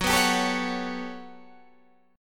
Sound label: G Major Sharp 11th Gb, chord